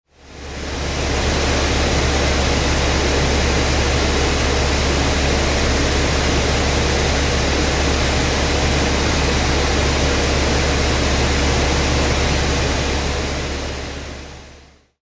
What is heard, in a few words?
pink noise fan